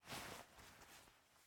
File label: Player Sit; Game rip; MiSide